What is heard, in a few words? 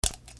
BREAKING